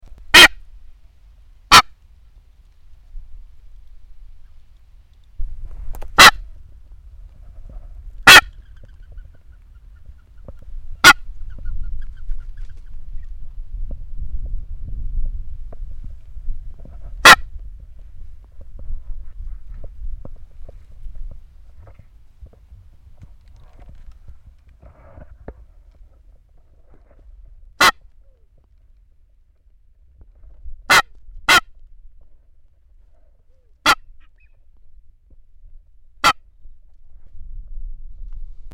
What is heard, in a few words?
Masked booby